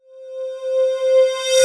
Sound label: ORIENT, PERCUSSION, REVERSE